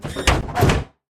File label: Hangar Door Shut; Lethal Company